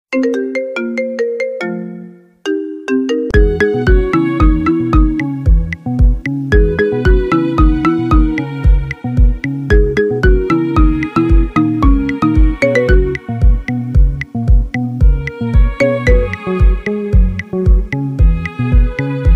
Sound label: marimba ringtone